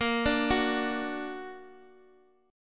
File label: Bb Augmented Bb Guitar Open D; x,x,x,4,5,4